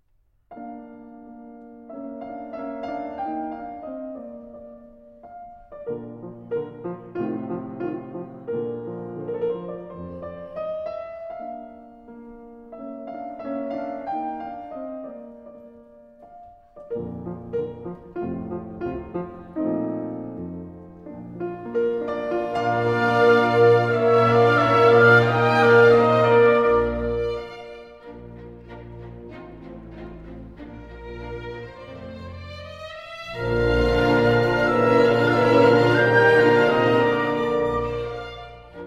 Piano Concerto No. 20 in D Minor; Mozart

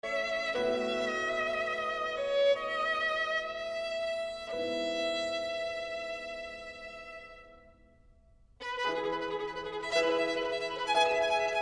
Violin Sonata in A Major, I. Preludio

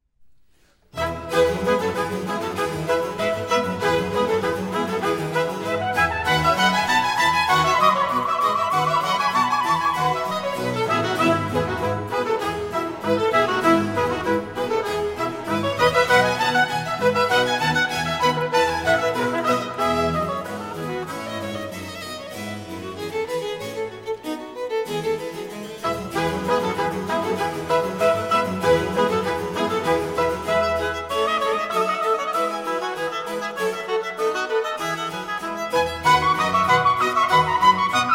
Concerto in F Major, Allegro; Brandenburg Concerto No. 2; BWV 1047

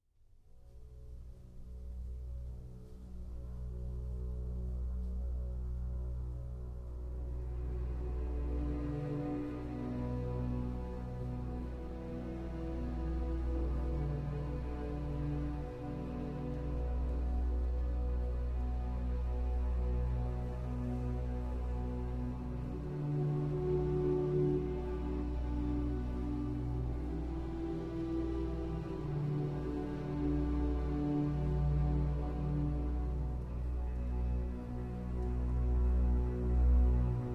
Strings in B Minor

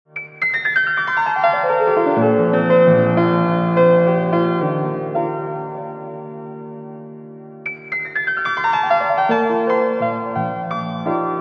Majestic Piano

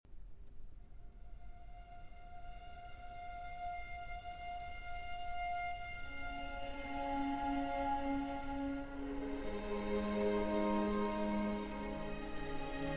Violin, Harp & Orchestra; Arr. For Violin, Harp & Orchestra By David Le Page

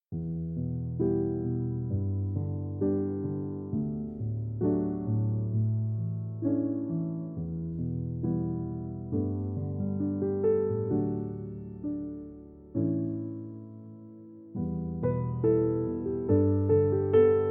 Piano Version

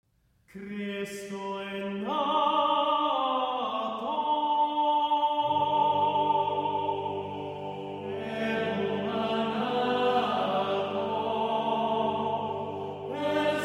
Vocal Ensemble; Laudario di Cortona; Excerpts Arr. P. Hillier; No. 18, Cristo è Nato et Humana

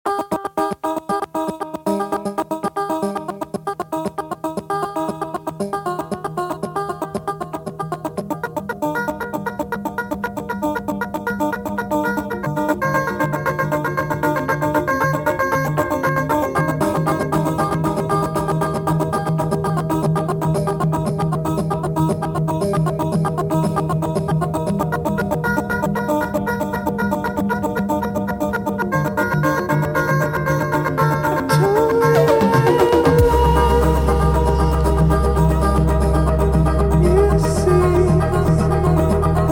Jungle Arp

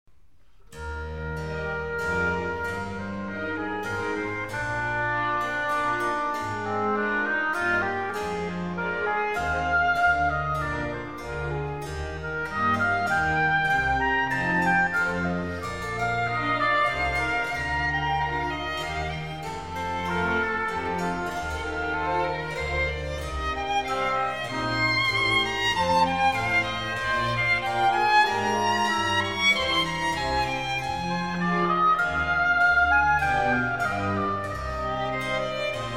Concerto for 2 harpsichords & strings in C minor; BWV 1060

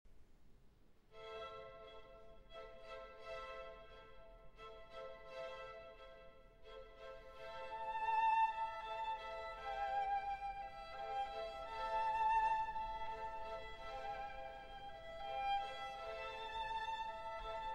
Concerto For Violin, Strings and Timpani, Live; No. 1; Esoconcerto; 2016 Revised version

